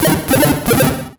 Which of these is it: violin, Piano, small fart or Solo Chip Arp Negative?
Solo Chip Arp Negative